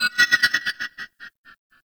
Vocalized Metal; Ambience; Warframe; Grineer; Asteroid

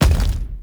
Obelisk Stone Connect

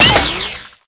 orb ricochet; Mod Quest; Warframe; The Teacher

